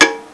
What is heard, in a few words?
Can Step; Presenter Professional